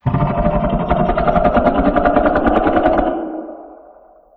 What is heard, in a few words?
Figure Scream